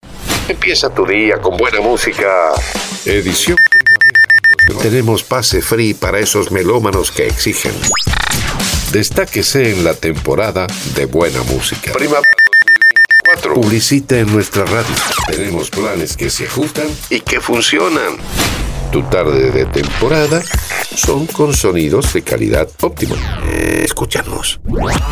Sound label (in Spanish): Ambient Primavera